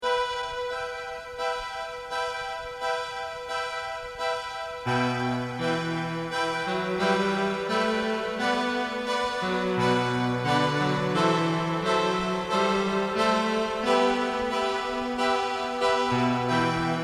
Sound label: synth